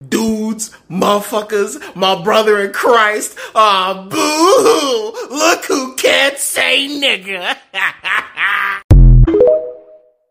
booohooo

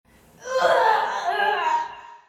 ahhhhh

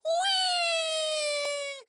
wheeee

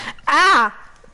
ahhh from a sneeze hater

